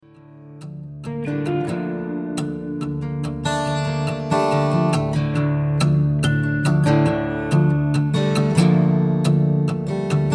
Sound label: MANDOLIN BLUES; Blue Ruby Records